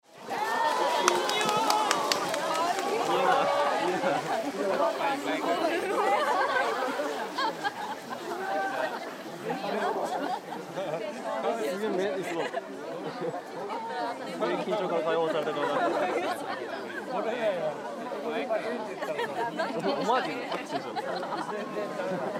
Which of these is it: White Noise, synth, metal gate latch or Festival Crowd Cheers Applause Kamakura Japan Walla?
Festival Crowd Cheers Applause Kamakura Japan Walla